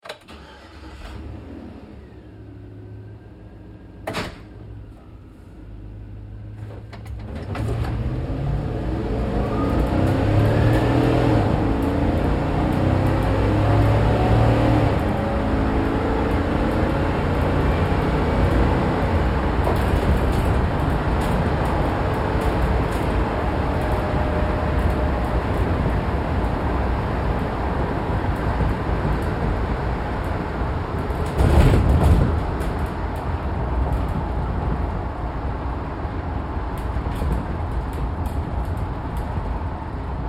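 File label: Truck GMC Savana Interior Drive Accel Decel Fast; Trucks; Big Room Sound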